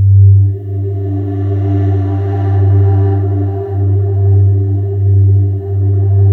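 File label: atmosphere; Sound Gallery - Charged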